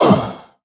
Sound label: blademaster death; Crypt of the NecroDancer